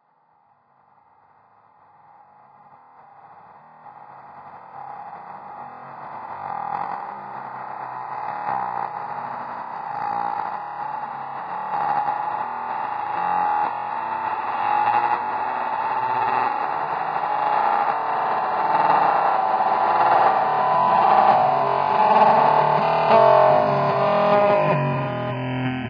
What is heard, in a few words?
evil laugh reverse; Source Engine; de deadblock